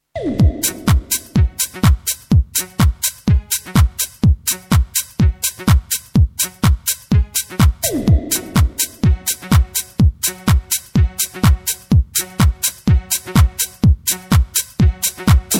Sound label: Club Mix